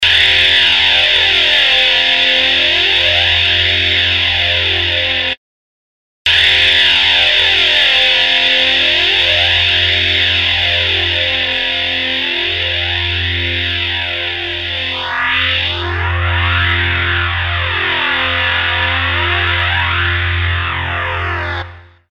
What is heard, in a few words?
Power Drone, Guitar